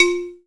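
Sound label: CHINESE XYLOPHONE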